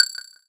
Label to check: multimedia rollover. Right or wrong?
Right